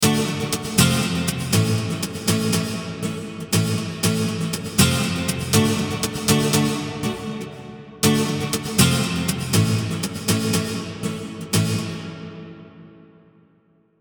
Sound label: acoustic reverb